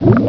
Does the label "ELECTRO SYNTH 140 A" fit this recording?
No